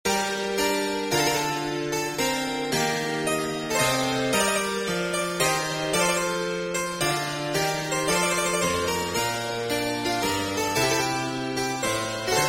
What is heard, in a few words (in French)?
Chaconne grave Pieces de clavecin; RetroMagix; Syntheway